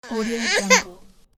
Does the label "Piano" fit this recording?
No